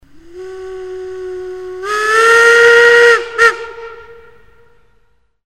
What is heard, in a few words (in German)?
Pfiff